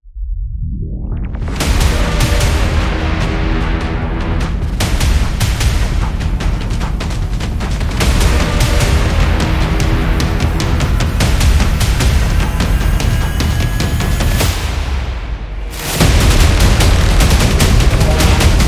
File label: Fast Epic Drums